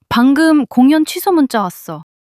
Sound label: surprise